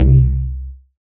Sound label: C Soul Bass